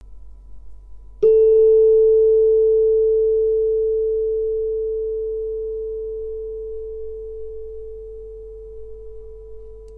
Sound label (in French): diapason